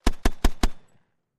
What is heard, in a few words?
AUTO GRENADE LAUNCHER, mortars